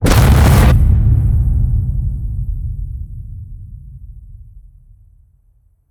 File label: HIT Distorted, Cinematic Hits Designed